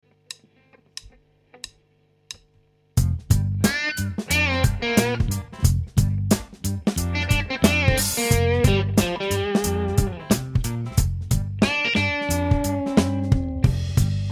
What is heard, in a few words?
sweep funk chant